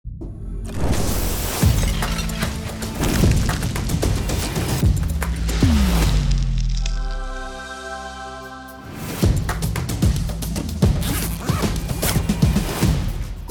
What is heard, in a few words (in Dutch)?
Muziek en SFX; Deliveroo